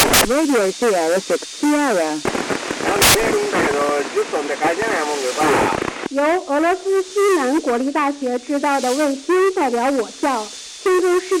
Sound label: ISS china